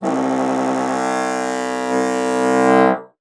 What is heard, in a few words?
ELECTRIC, bassoon; Xsample VOL-6